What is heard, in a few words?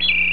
backgnd bird; Tweety Sounds